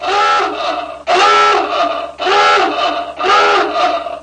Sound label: submarine alarm, drum loops and breaks